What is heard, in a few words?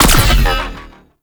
Space Fighter Elite Laser Fire; Warframe; RailJack; Corpus; Space Fighter Laser Agent